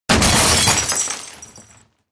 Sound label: glass breaking